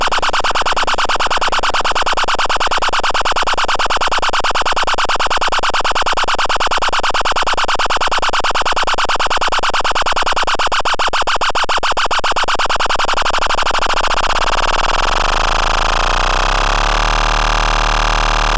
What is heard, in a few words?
magical 555 vco